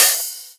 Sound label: Open HH, Cymbals